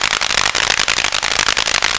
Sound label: ELECTRO CUT; E-MU Formula 4000 Series Vol. 3; Analog Odyssey